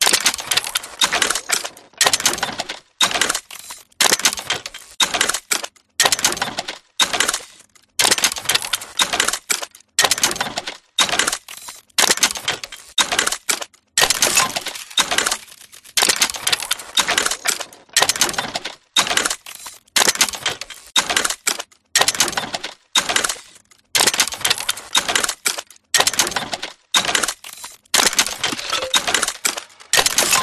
Steampunk Mechanical Loop 120BPM; PMSFX

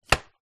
leisure puzzle jenga pieces in box place down; zapsplat

